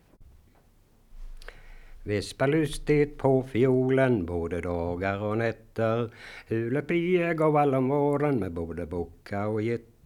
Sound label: Folk music of Småland; Vispa lustigt på fiolen, efter modern